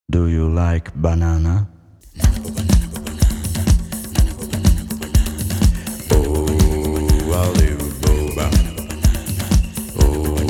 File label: Drums Music